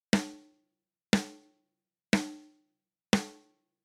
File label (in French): caisse claire sans effet